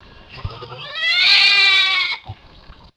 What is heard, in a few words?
single raspy lamb bleat; animals